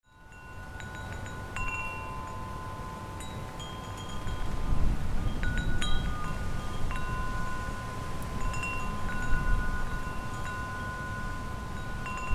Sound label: Wind Chimes Day Ambience